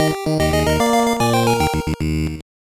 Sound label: Jingle, soundtrack; Momotarou Dengeki 2; SGB